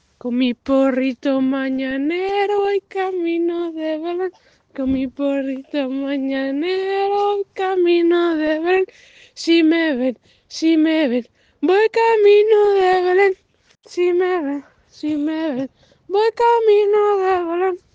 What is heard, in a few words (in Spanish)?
camino de belen remix